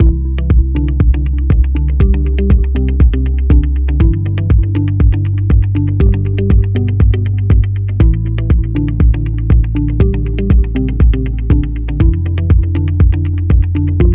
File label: Corporate